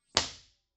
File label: impulse response; Corso acustica